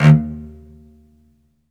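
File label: solo cello, strings; ILIO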